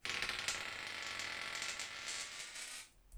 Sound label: charge